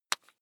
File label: electric drill bit loosen